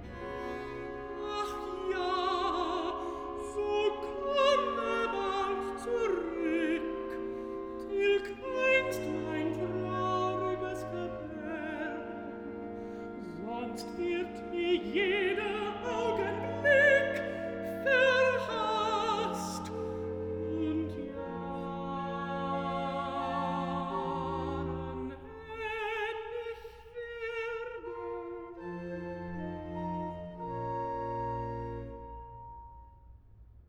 Recitative (alto); Johann Sebastian Bach; Lobet Gott in seinen Reichen BWV11; Archiv

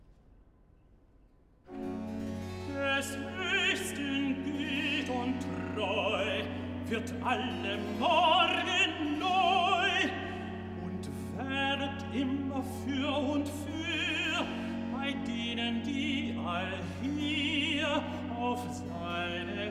Cantata, Recitativo (Tenor); BWV 10 'Meine Seel erhebt deb Herren'; Bach; Paris; 2nd Sun After Trinity

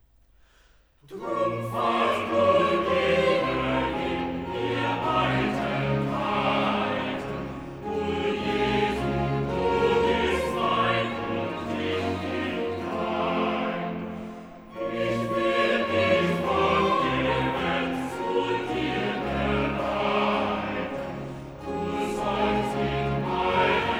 Cantata, Choral; Epiphany; BWV 123 'Liebster Immanuel, Herzog der Frommen'; VI. Choral 'Drum fahrt nur immer hin; Bach; Weimar; Leipzig

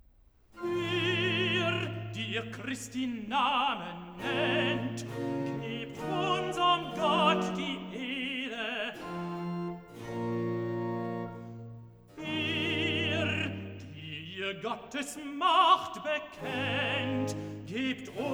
Cantata, Recitativo (Tenor); BWV 117 'Sei Lob und Ehr dem höchsten Gott'; Bach; Warwick; 4th Sun After Easter

